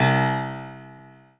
Piano Ff

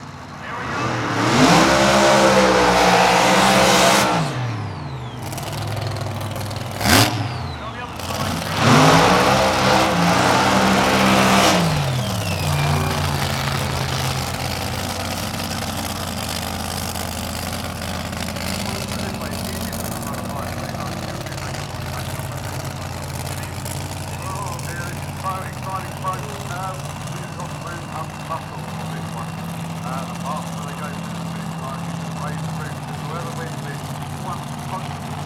Drag Racer Burnouts

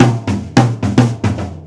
Loop Tom 3 4 time

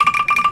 Bandung Angklung Vib

